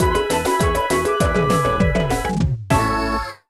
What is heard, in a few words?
Jungle Falls Finish